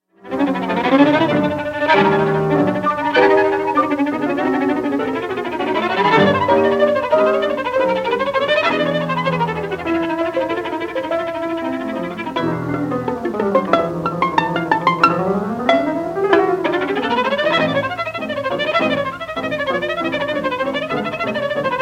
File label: flight of the bumblebee arranged for violin and piano; Rimsky-Korsakov Nikolai